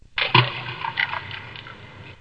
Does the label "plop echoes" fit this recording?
Yes